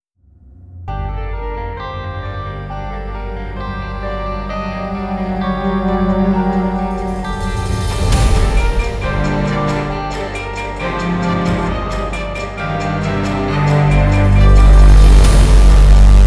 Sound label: Trailer Horror